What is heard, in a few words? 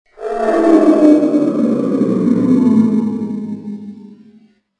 monster